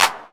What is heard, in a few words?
909 clap; Perc; Goldbaby Tape808 & Tape909; The Tape 909